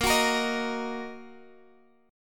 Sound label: Bb Suspended 4th Sharp 5th, Bb, down, Guitar, Half Step Down; strummed